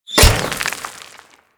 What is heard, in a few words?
Kunai Impact Dirt; Warframe; Hit Effects